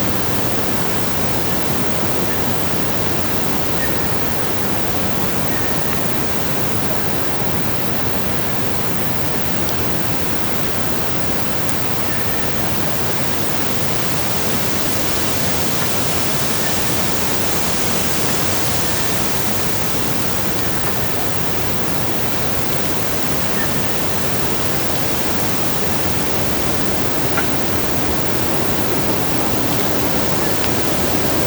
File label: earth amb